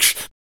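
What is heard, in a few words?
vox percussion, Vocal Planet Jazz FX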